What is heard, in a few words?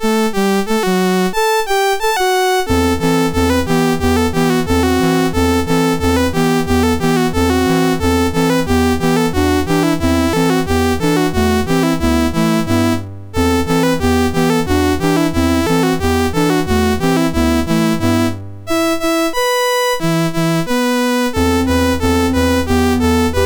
MOS6581; MOS6581R2